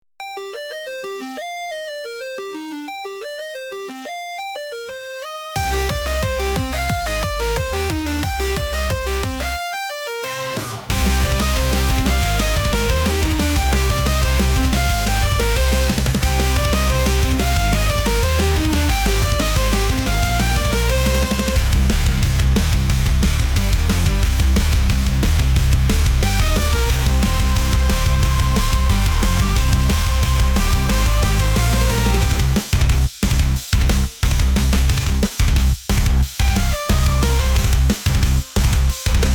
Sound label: chiptune nu metal; gae wild jam